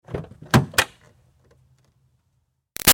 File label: Car Highlander Door Open Hard; 2005 Toyota Highlander V6; Cars; Vehicles; Big Room Sound